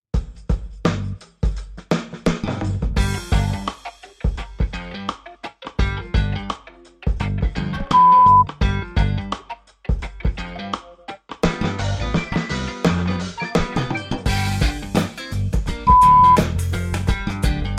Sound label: Groove